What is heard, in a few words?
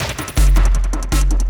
LOOP, Drum & Bass, 160 BPM